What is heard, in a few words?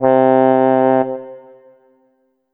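Kawai K5000W English Horn C3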